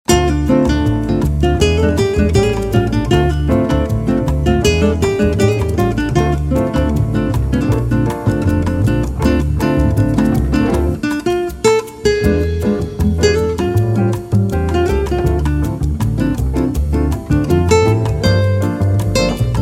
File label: Acoustic Guitar; Daniel Giron